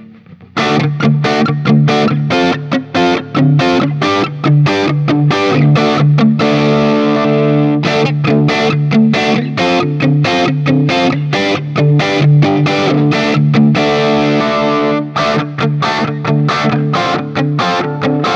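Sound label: Guild X175 JCM800 D, guitar; Guild Newark St X175 Manhattan Special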